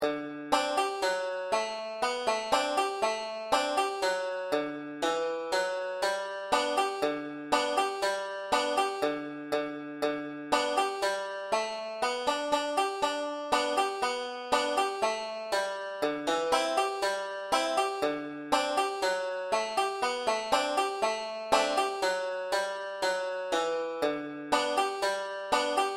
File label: wabash cannonball banjo only two thirds tempo, Slow Banjo Solo for Practice